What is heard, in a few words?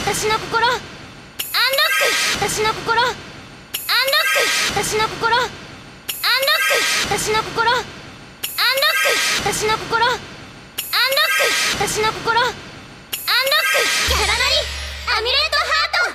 RINGTONE